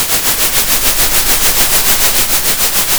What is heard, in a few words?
center, Sound of a train